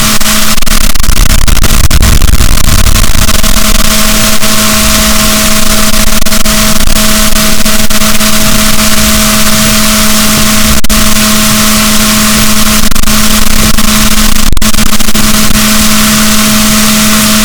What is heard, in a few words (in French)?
rorquals positif, BalaenopteraPhysalus, boat; SNR 6dB